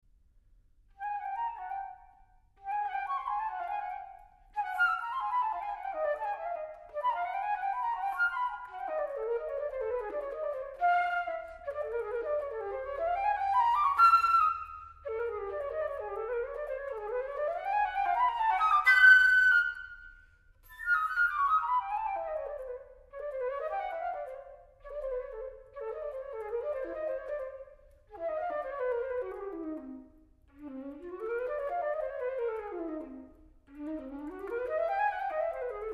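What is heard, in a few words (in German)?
Musik in vier Sätzen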